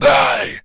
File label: Attack, Zombies; zps; Z Carrier Speech